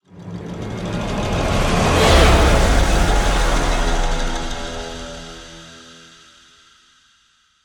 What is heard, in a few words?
Backstab; bayonetta 3